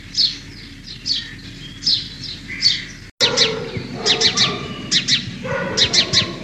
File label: bird audio